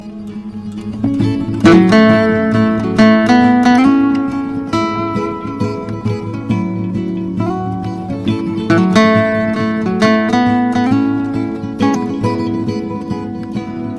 Break Musical Tone

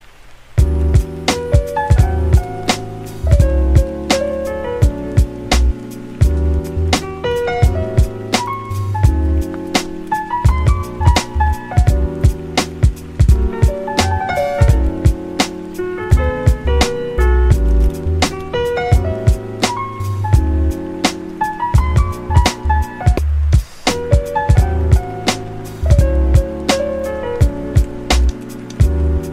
Chill piano theme

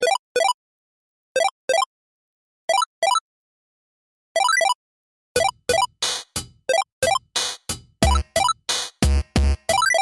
Chiptune

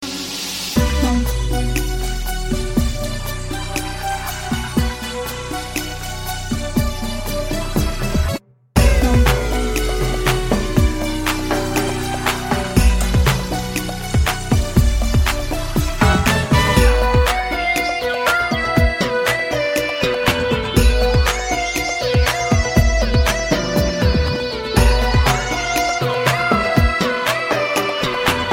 Bgm; Raat; meloboom

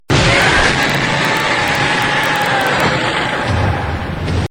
Loud Roar; Diablos

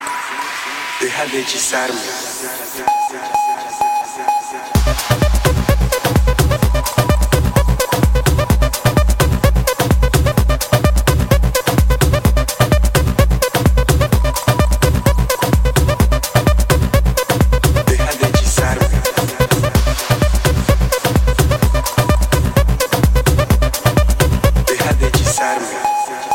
House Hit

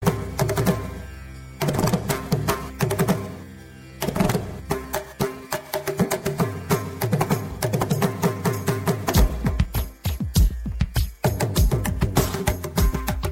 Tabla

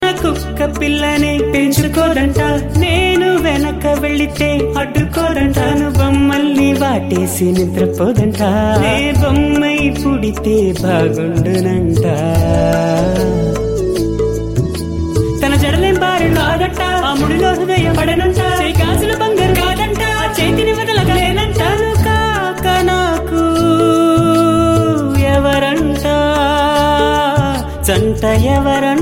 Melodious Song

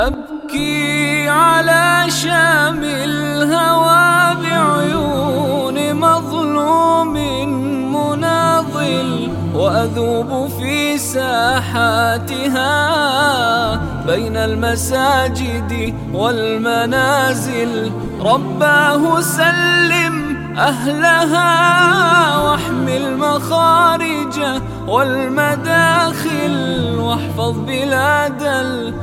islamic

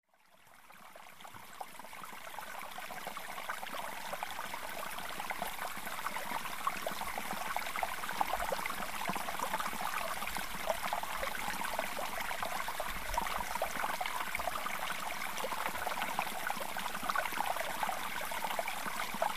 Waterfall Sound

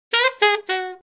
Death Sound

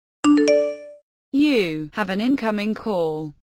Incoming Call

